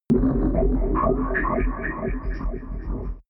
transmission underwater signal

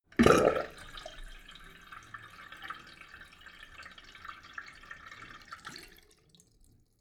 sink fill light short

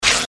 short industrial event

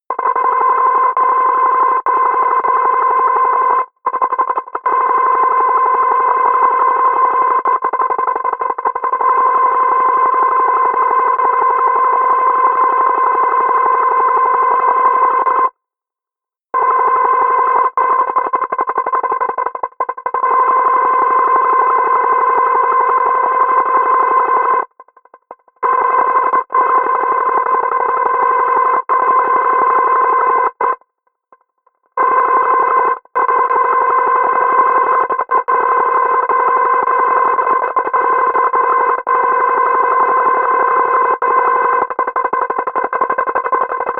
atmosphere old scanner

